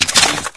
pulse draw, weapons; scmod; ut99

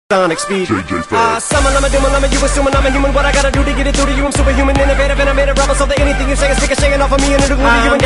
rap

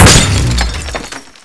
ice bolt explode

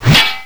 wrench big hit

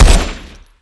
assault shot

